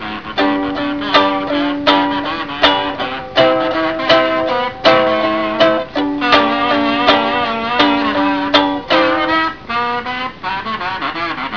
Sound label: kazoo